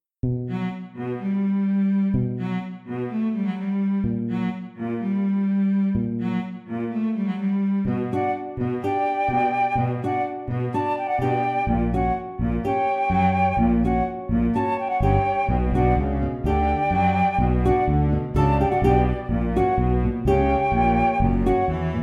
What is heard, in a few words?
sextet